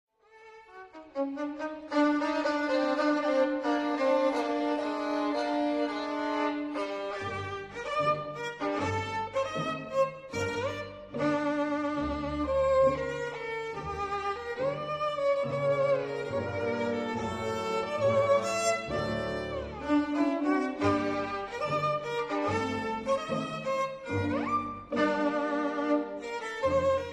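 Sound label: Scène de la Csárda for violin & piano; No. 14; On the Themes of Lavotta; Op. 117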